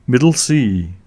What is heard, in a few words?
Middle C